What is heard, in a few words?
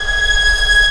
ANGELIC STR C#5; AKAI S6000 CD-ROM - Volume 1; Strings